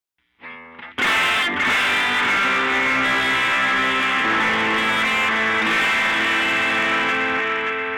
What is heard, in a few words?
tube delay wet time right feedback long bass